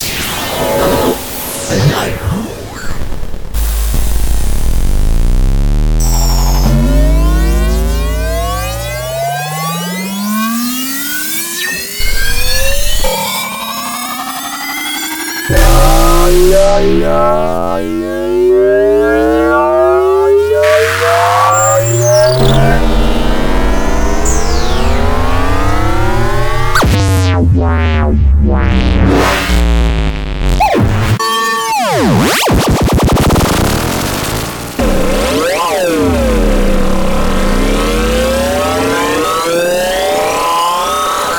Dubstep FX; Bluezone

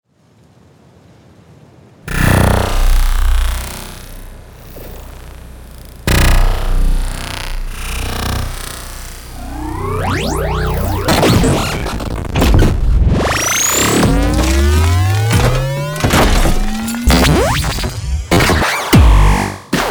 Robot Sound Effects; Soundwave; Bluezone

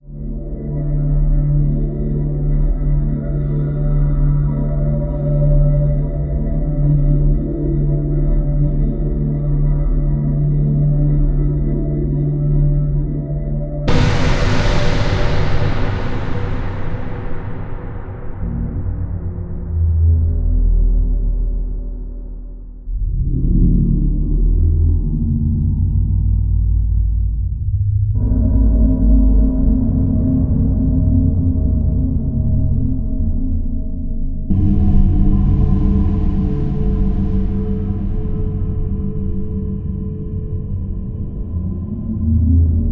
Deadspace Sci Fi Ambiences Drones and Soundscapes; Bluezone

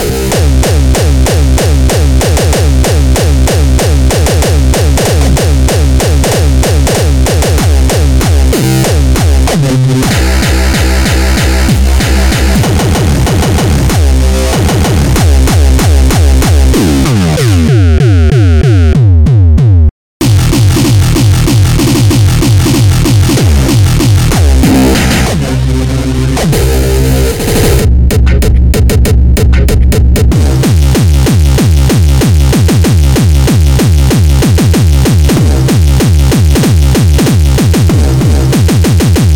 Hardcore Kick Drums; Bluezone

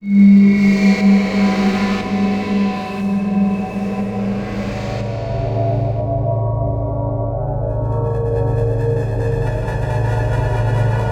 Scary Ambiences and Sound Effects; Bluezone

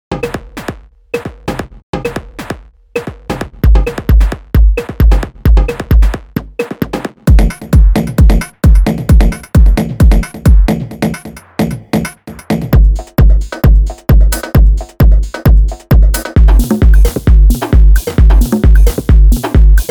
Tech House Drum Loops; Bluezone

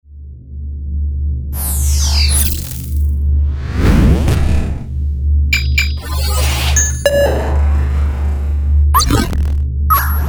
Alien Interface Sound Effects; Bluezone

